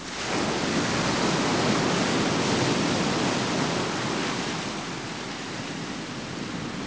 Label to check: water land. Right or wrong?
Right